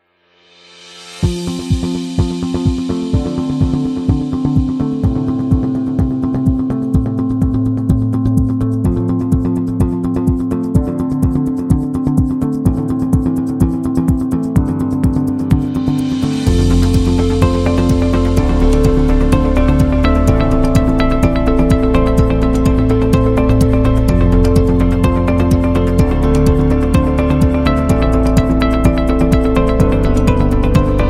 Learning

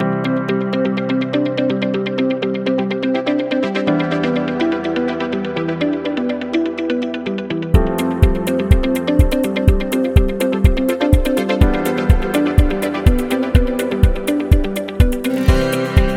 The Positive; positive vibe

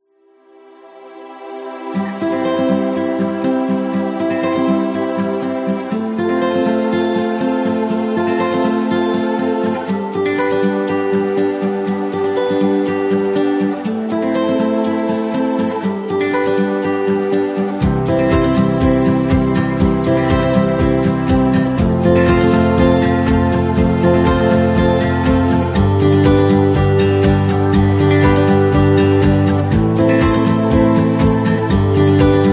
Upbeat Uplifting Guitar; happy music vol 7

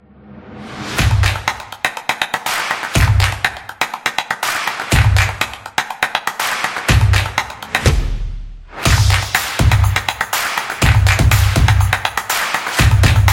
Clapping Beat

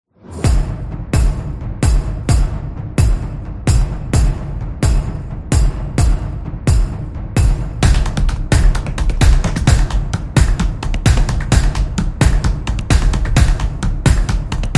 Tribal Dance

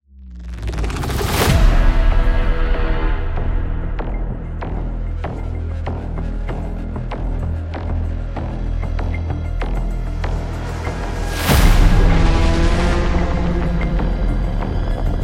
Angry

